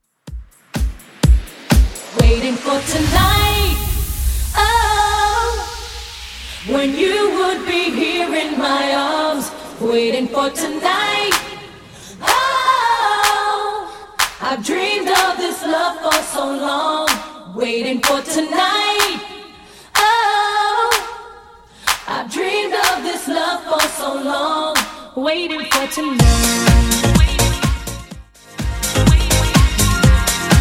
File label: 90s Epic Intro 125bpm